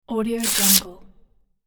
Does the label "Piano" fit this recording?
No